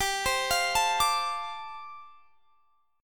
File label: G 7th Suspended 2nd Suspended 4th